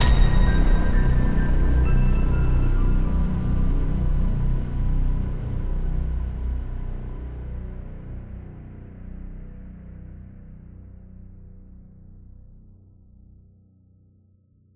Horn; Desolate Logo

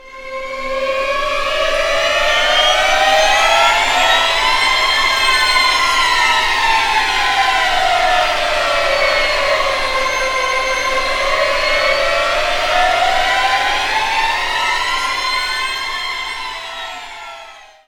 Strings; Forest; Torchlight III; PS4

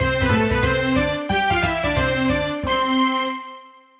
Something Good Ditty

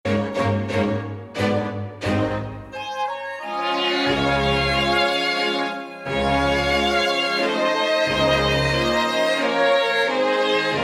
A Waltz - Bad; paper mario the thousand year door

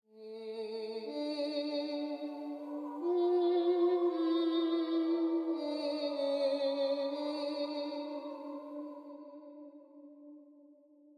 Singing Before Disappearing; Sfx Ch1 Mound Of Stones Älvdrottning; Oknytt windows gamerip 2013

